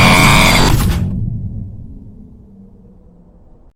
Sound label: jumpscare